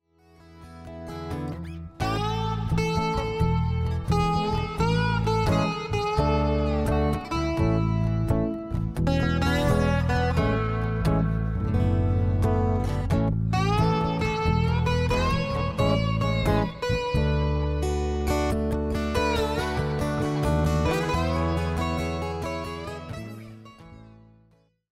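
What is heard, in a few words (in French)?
guitare acoustique slide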